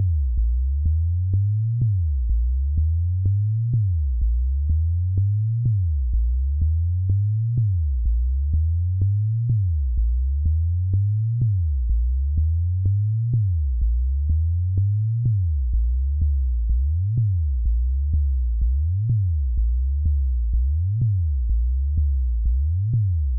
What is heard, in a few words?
Elektron Analog Rytm Impuls Sub Pattern